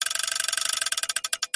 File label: turn; TurnTable